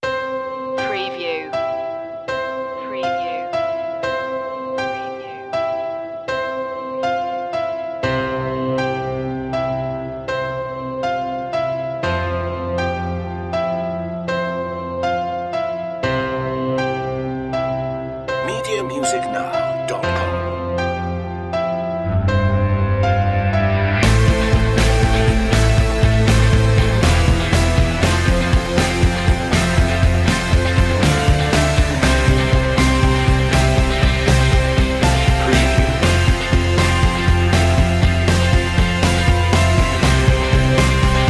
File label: anthemic rise